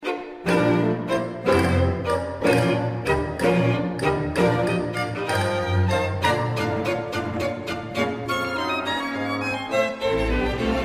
Violin Concerto 2; Prokofiev

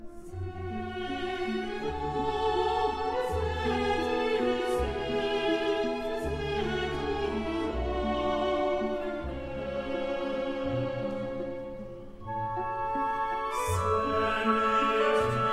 Pavane w Chor; Faure

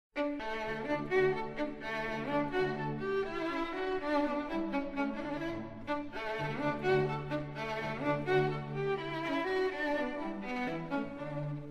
Beethoven Violin Concerto

